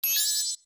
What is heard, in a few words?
Gleam; Tatl gleaming